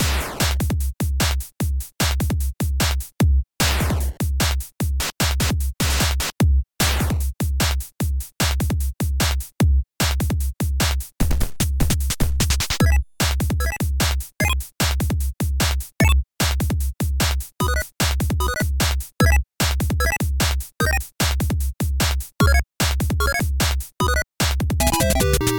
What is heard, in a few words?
drums